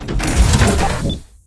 robotics rocket turret mech deploy; smite; vulcan

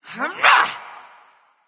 grunt attack; Smite; athena

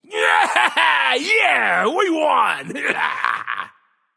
nv yay; smite; voicepack; xbalanque

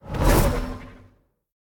whoosh; Smite; Tiamat

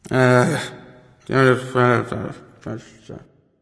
grumbling; erlangshen

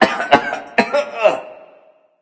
clear throat; cernunnos

